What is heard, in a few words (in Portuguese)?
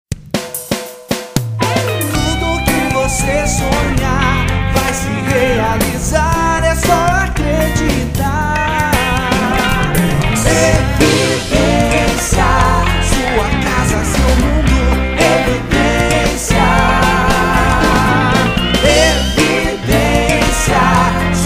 jingle imobiliaria; evidencia